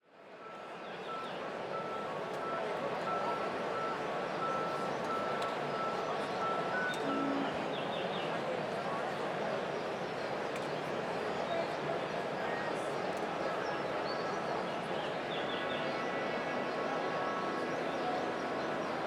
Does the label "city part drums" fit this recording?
No